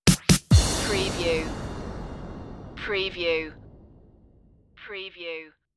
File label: digital kutz stinger